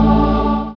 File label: synth choir, dance synth; Sound & Vision - Gigapack I CD 2 (Roland)